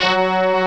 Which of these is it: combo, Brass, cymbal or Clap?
Brass